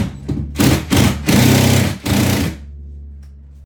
fart explosive

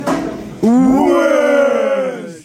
wshhhh

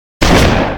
shotgun

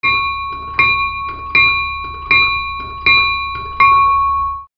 bell donk

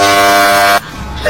bad mic

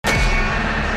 bell tum tan sound

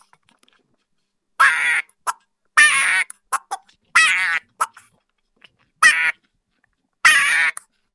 make a duck sound

